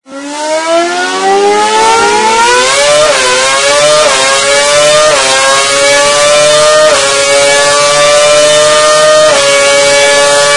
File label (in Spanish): carro carreras